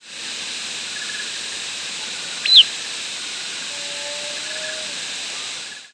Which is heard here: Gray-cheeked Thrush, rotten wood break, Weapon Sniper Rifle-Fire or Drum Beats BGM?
Gray-cheeked Thrush